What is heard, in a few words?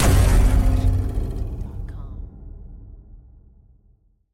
Scary Hit; soundscrate